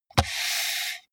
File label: computer mouse draw drop